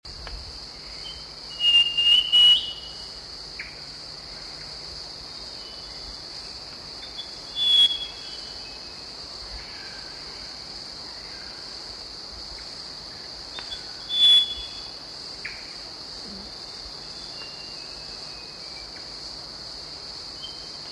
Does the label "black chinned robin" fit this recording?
Yes